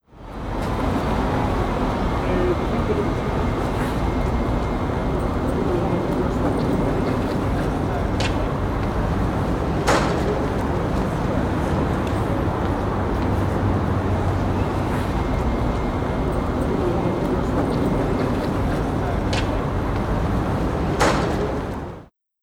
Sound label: Cities nyc Traffic